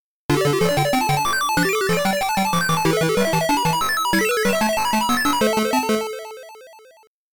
Match Start; Pit-Fighter; Game Gear; Master System

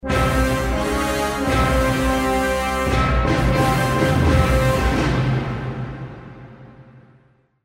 Arena Tune; The Chronicles of Spellborn

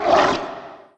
attack spitter

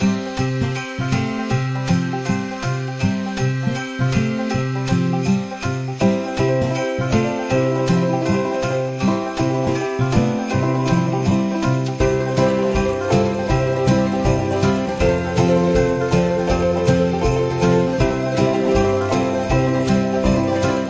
Train Area Music Winter; Hay Day; android

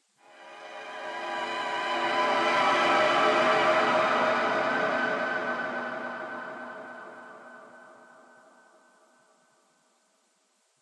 Combat String Start; The Sinking City

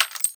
Panel Reassemble Pickup; Among Us

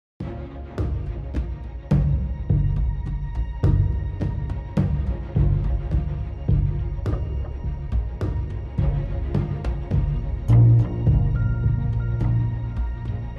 Loop Tense; Game of Thrones: A Telltale Games Series

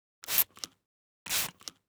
Atomizer Envelope; Yesterday Origins

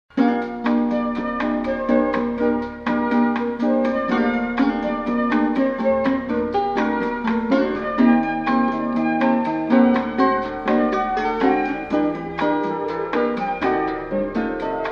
Elevator Music; Call of Duty Aether Soundtrack

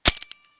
sound Pickup Nut

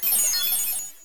SFX Shooting Star; life is a game android

